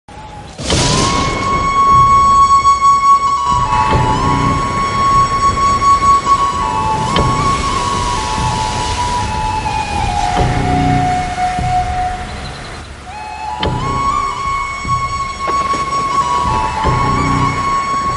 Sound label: Tamil bgm; citizen